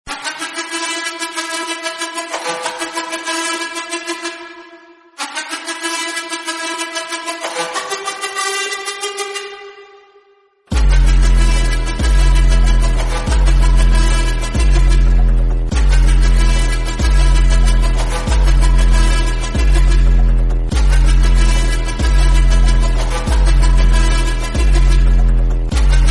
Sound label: army entry bgm; indian army; uri